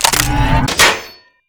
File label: powerup pickup resistance; Garry's Mod; tf2